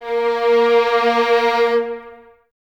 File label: Violins Detache A#3; Miroslav Vitous; 11 Violins